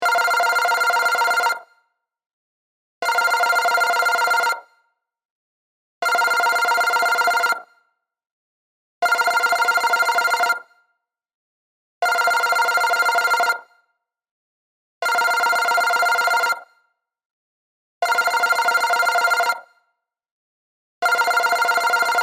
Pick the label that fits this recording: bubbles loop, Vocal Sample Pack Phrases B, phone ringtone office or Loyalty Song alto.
phone ringtone office